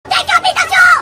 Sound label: explode; minecraft; Texture Pack